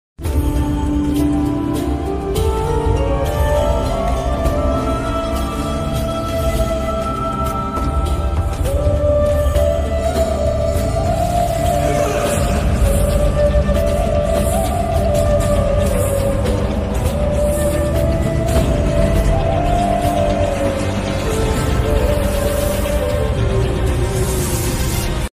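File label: background music ringtone; the goat life; musicbuzz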